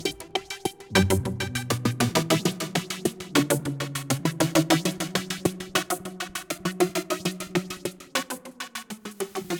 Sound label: bass sequence texture; synth; instruments; loops